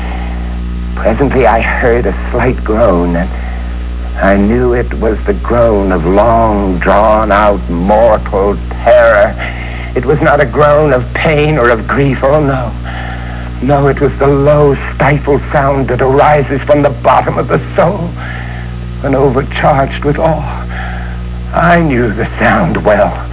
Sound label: groan; Vincent Price